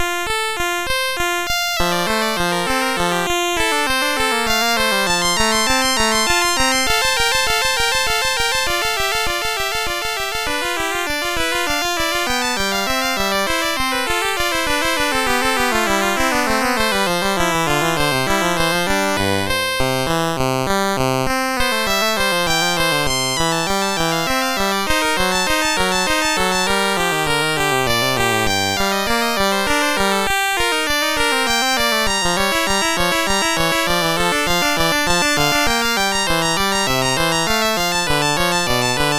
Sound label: Invention in F, MOS6581R3